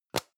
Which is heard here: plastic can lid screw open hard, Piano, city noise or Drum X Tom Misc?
plastic can lid screw open hard